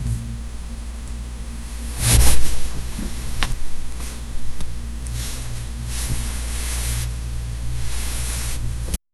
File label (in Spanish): ropa persona con traje, suit